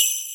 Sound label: Ringing